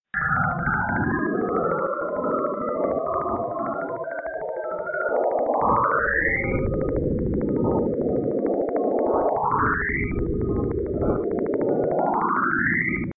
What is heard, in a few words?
Cassini Saturn Radio Emissions